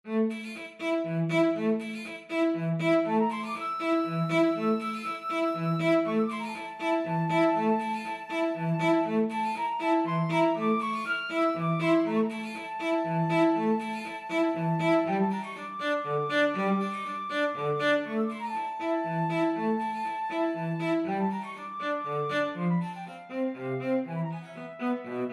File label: flute cello duet